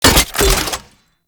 Pistol Alt Fire Mech; Weapons; Scaldra

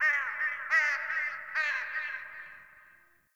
Crow; Dagath; Warframe; Ambience; Clan Dojo; Dojo Misc

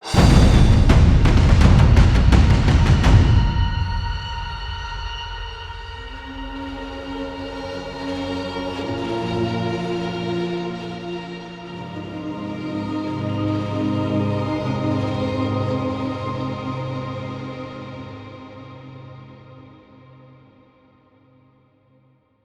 Boss Battle Cue End; Bosses; Nimrod

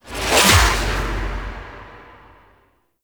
Territories Wave Cleared; Warframe; Missions

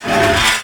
Derelict Mover Malfunction Start; Orokin

